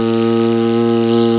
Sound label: buzz